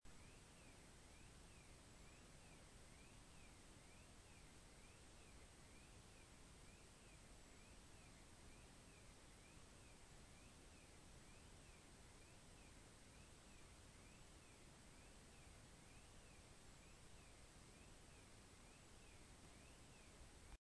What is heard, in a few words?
car alarm